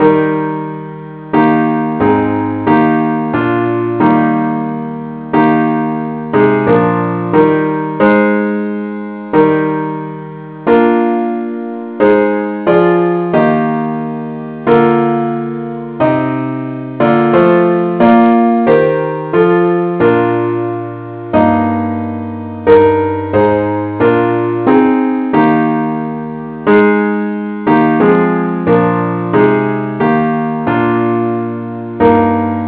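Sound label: parts only key E flat; christe Sanctorum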